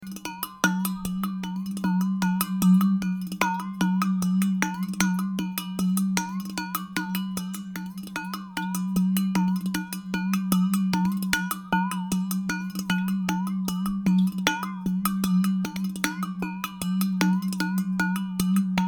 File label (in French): percu gourde